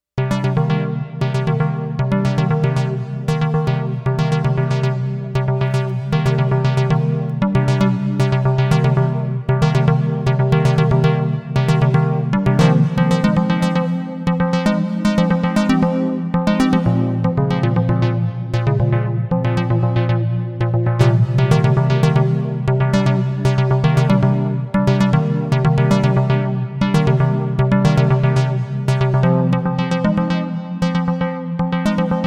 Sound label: Chill Arpeggio at 114bpm